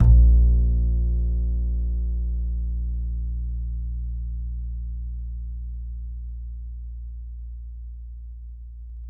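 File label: acoustic bass soft A#1; Masterbits CLIMAX 7 - Bass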